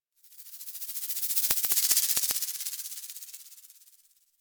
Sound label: Foliage Insect; Warframe; Duviri